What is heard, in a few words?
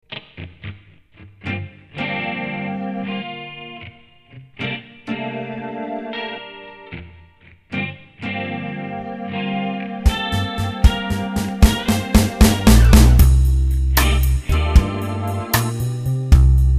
lead No Gt Solo Key Of G Minor